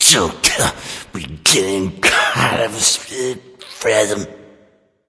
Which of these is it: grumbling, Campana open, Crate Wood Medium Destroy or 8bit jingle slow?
grumbling